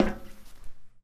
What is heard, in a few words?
metal, Footsteps; zps; Foley